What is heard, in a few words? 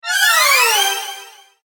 Cinematic Whoosh Flyby